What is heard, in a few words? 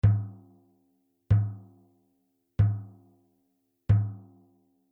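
BASS Ctd Soft Felt, bass drum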